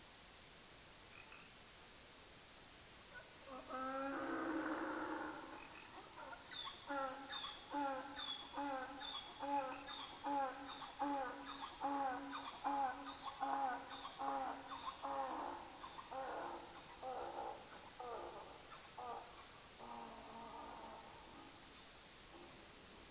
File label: Equus asinus; Ecología del Paisaje Sonoro